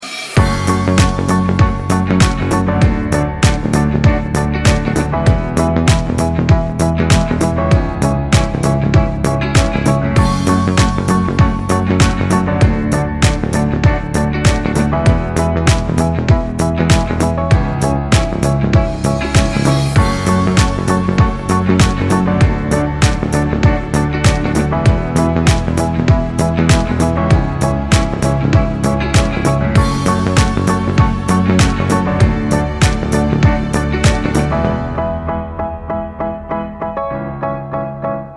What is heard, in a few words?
INSTRUMENTAL RINGTONE